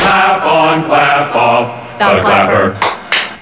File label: clapper